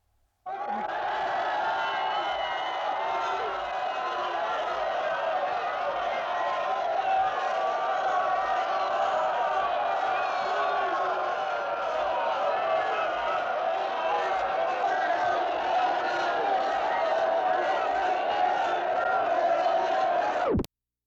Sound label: Large Excited Crowd